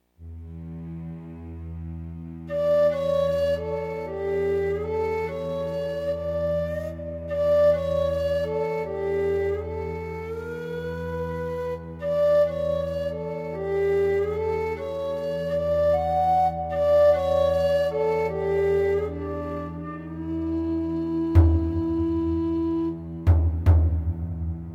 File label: Low Whistle; Banshee